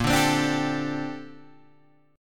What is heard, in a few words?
A sharp Major 13th, Guitar Open G